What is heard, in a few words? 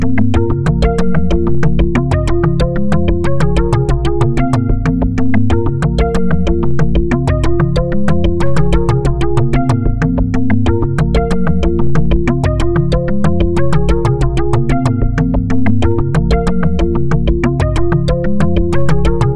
Lg Trick You, Ringtone